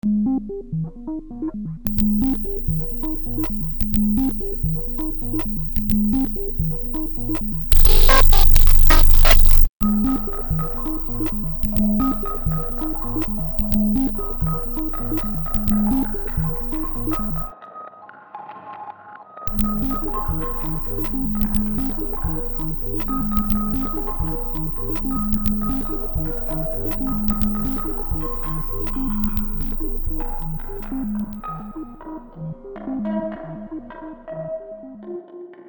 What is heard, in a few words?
granular